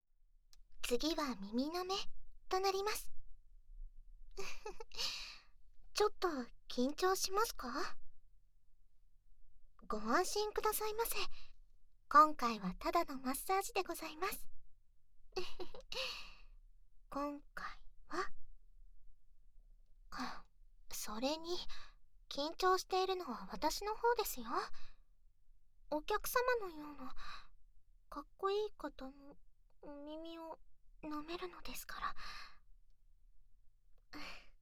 耳舐め; 赤ずきん; 【耳癒しASMR】オトギノリフレ～赤ずきん～

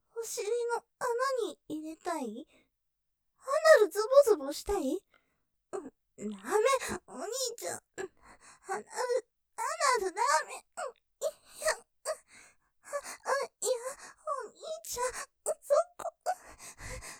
喘ぎ◆アナルずぼずぼされちゃうの??; ステレオ

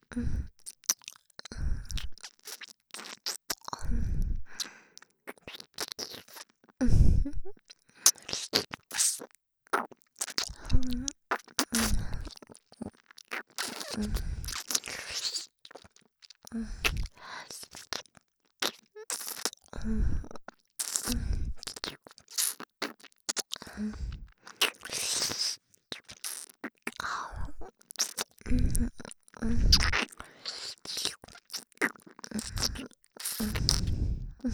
ソフト 耳舐め

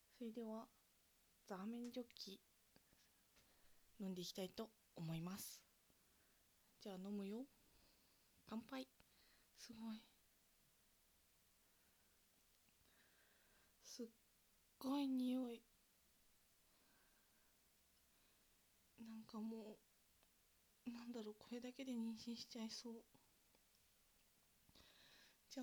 ザーメンジョッキを飲んでゲップ

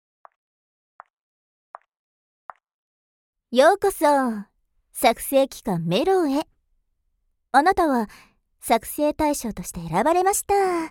囁き 耳舐め 手コキ, ASMR; 囁き耳舐め手コキ編; 黒ギャルお姉ちゃん

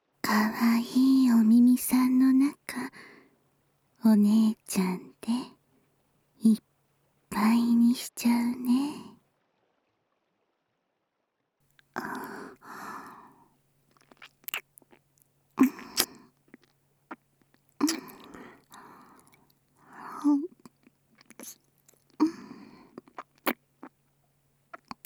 とろける耳舐め; まほろば庵 秋葉1

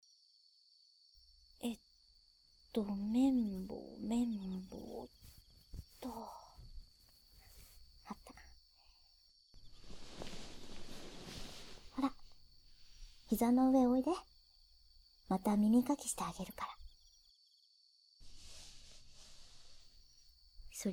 右耳かき; かわいいって言われるの嬉しくない?; BGMなし

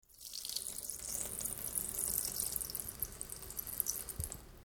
おしっこ; しょおおぉおしっこ 約5秒

